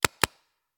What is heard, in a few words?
Paintball Gun; Sound Ideas; Guns